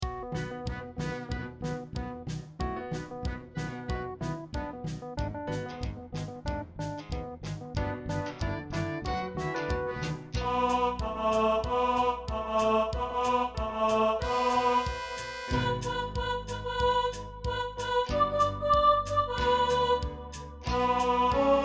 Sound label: Soprano Alto, rehearsal